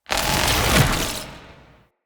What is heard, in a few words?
Galvanic Button Power Up